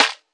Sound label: clap, drums single hits